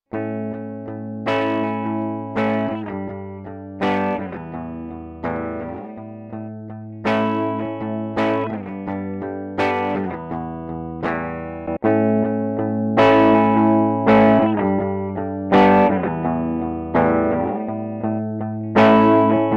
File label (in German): Boss EQ 200 Mids and Lows Zerre Pre